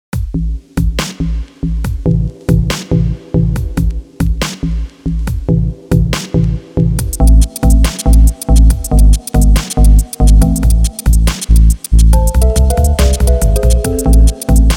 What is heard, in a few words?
Polyrhythm Dub